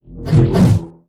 beam sword; Weapon

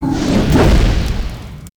WAR ATTACK; SIZZLER; Mob

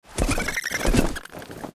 THORNASH PEACE WALK

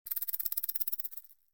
Rattle Snake, ambience

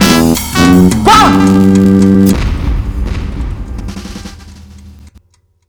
Draw

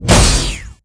Bsword Metal, Damage

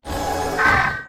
WAR POWER UP; GRAVELAPIS; Mob

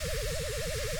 PEACE WALK, Mob; HOBOROBBER

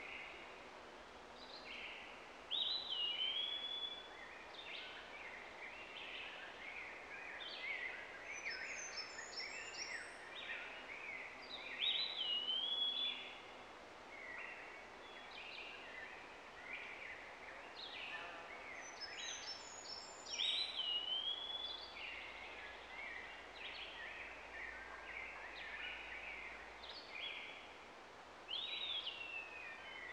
Tree Bird, ambience

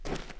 Gravel Run

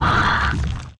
MASS SAND WORM PEACE DAMAGE

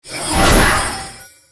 war attack

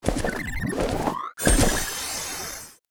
WAR POWERUP; THORNASH; Mob

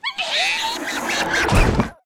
Die; Mob

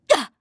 High elf Warlock damage

